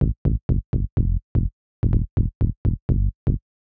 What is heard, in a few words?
SNTH BASS, Bass; eJay